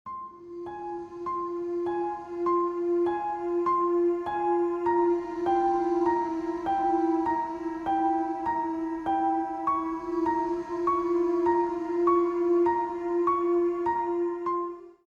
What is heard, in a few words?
a piano with a creepy atmosphere for scary stories